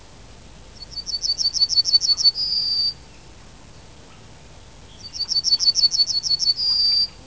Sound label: Emberiza citrinella